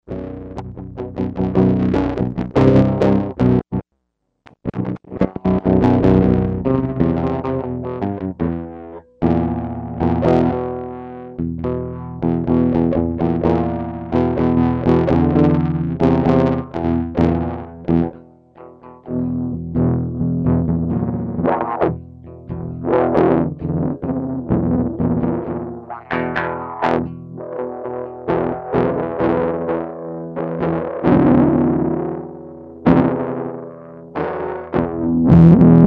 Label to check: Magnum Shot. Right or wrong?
Wrong